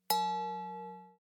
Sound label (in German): Metall